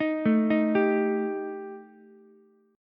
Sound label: G Suspended 2nd Sharp 5th G Ukulele; Gsus2#5 arpeggiated; JGuitar